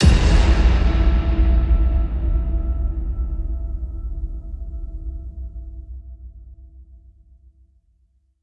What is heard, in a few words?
Hit Big Black Powder